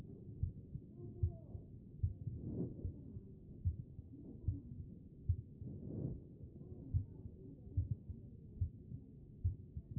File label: CHEST pos4 B MODE